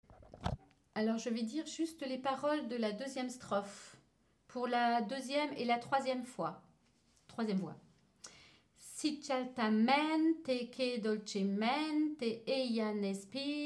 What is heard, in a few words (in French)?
2ième 3ième voix